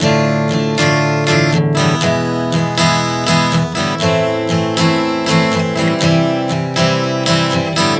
D-E-Am-C 120 bpm, acoustic guitar chord progressions